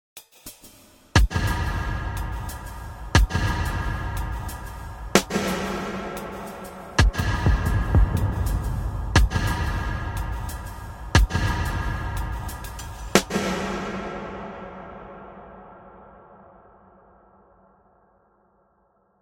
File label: DEEP DAMPED CAVE